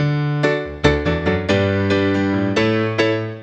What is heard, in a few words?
Grand Piano D major